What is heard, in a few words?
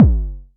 Short, Kick, Traditional; Multi-Kick; Long